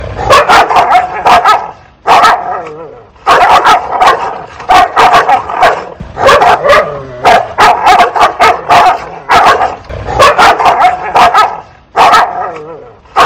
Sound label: bark